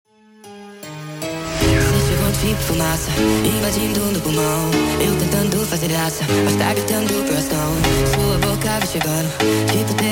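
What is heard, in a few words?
sped Up, phonk music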